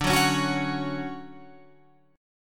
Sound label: D Major 7th, Guitar, Open F; DM7 strummed